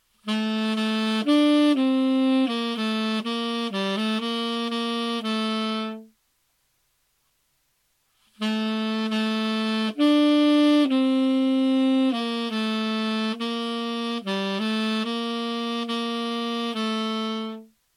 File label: Saxophone